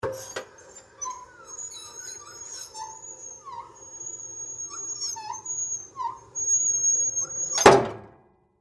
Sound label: Genie Lift Down, Hydraulic; Industry, Genie, Lift, Down, Hydraulic, Perspective; Machinery Sound Effects